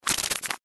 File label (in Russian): bystro prolistali knigu